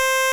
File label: Beep; Bitkits; Blip & Blop